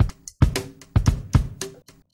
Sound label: Drum loops, Boombap